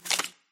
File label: card flip